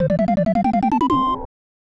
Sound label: Unused Jingle; Super Double Yakuman; SNES